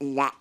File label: fart fake chuk duck; Farts